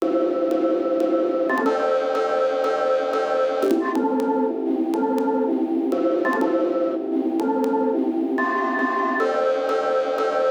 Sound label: Breakcore Pad